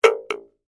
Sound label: Spring Stress, Thunder, Tube; Texture; Twangs (Sound Effects:Objects); BlastWave